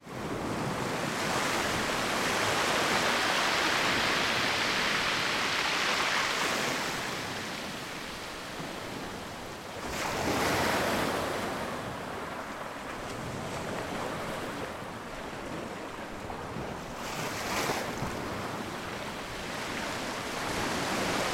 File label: nature ocean waves recorded on jetty